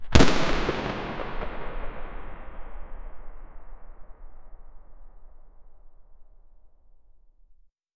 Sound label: outdoor gun explosion, reverberant